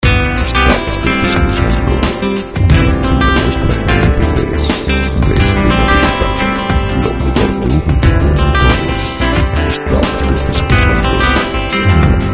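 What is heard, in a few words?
90 bpm pop guitar; Música pop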